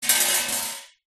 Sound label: metal basket small drop concrete; zapsplat